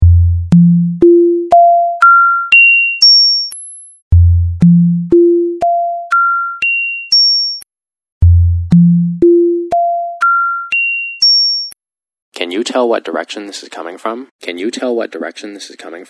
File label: middle left right